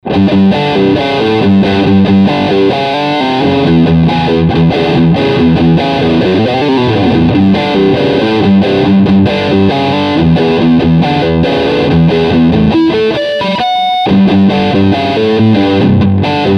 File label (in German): Riff High Gain Steg HB